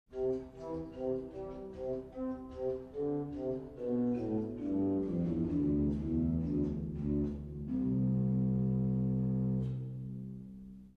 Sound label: Cello 8; Schultheis Schlimbach